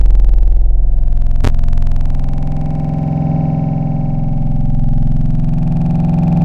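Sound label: Vocal Guttural, wavetable; Synthetic's Serum Collection; Echo Sound Works Core Tables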